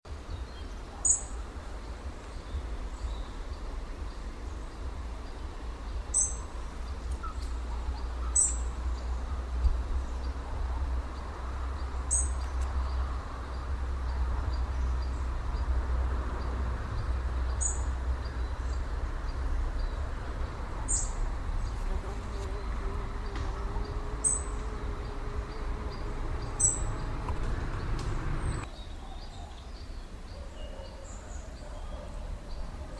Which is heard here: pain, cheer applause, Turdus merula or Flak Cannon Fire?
Turdus merula